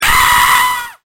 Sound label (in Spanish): GRITO